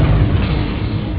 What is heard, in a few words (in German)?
Feind expl; MatrixHunter V1.1